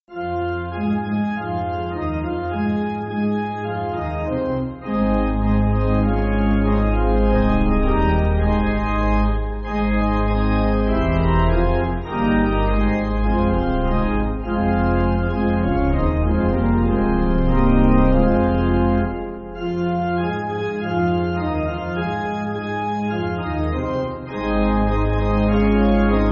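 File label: Pipe Organ, Music Backing; Come Ye Sinners; Arise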